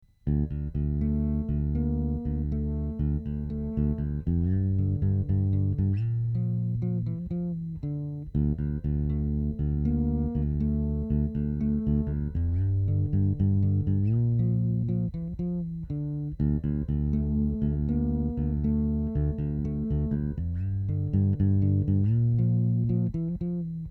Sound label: semi acoustic